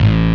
DIST SB2 A-1, STD SYN BASS; AKAI S-Series CD-ROM Sound Library VOL-7

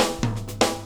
FILL

